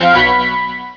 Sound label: alarm; quake; fortress